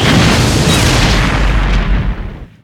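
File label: Explosion Large; SFX Kit; Destruction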